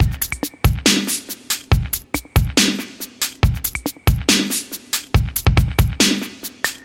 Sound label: beat